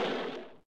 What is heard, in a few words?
Noise; SNES; Brass Numbers